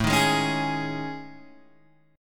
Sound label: A Major 7th Flat 5th, Guitar Open G; chord